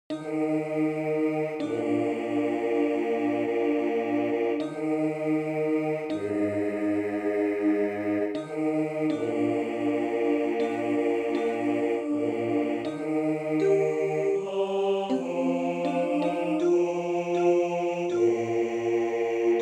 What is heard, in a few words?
Alto Bass; Justorum Animae; Byrd